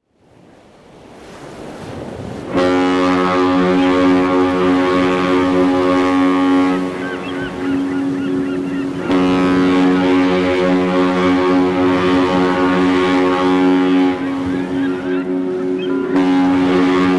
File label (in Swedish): Fartygstuta